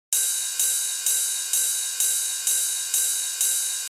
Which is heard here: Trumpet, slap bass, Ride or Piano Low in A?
Ride